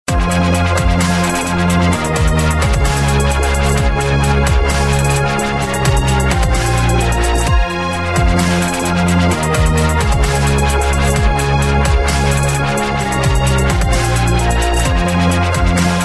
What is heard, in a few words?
best dubstep